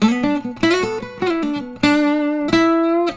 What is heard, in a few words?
Blues Guitar LICKS; ILIO; Fretworks